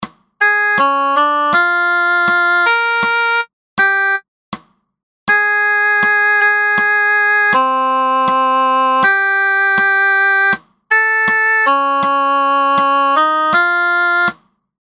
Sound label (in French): Musique algorithmique